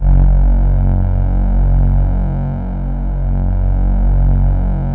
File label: RESONANT F#1; Strictly Synthe3; E-MU Producer Series Vol. 6 – Analogia Project